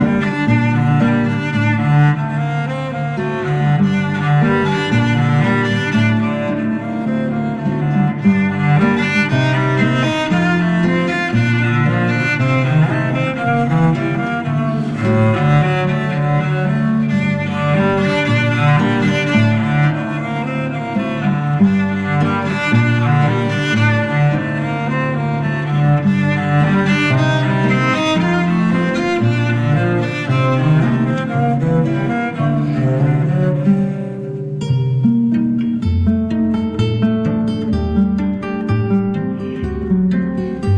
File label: Etude (arr. Cello & Guitar); Aaron Sinclair; 13 Pieces, Op 76- 2